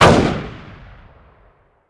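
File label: weapon ar fire player atmo exterior; gmod; viper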